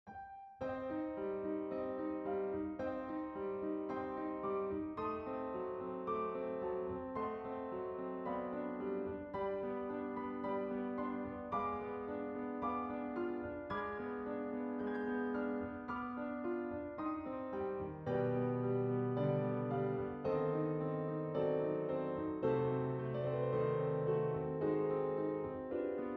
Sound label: Piano Quartet Rondo, in c minor; III. Rondo